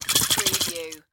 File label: Aerosol Can Shake; sound effects bible